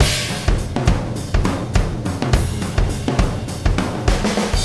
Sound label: Wormhole Beat, Processed Drumkits